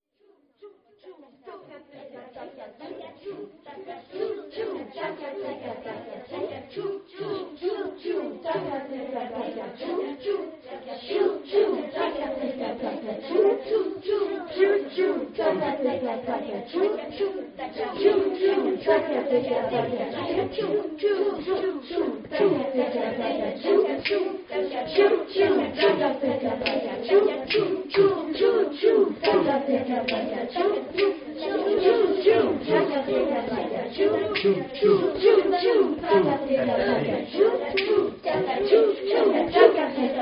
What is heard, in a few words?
indian train